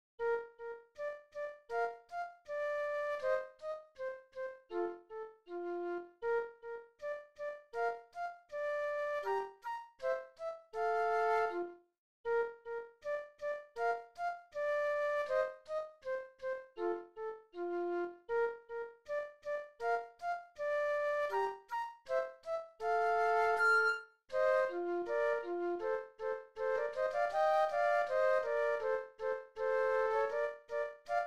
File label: Andante Flute Duet; classical; Haydn